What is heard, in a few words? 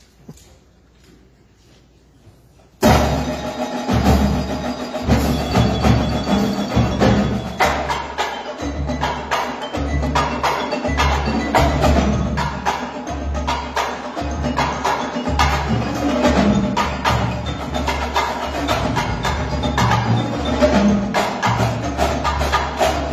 Drum Solo; york pa